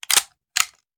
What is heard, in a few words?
Machine Gun Foley; Sound Ideas; Guns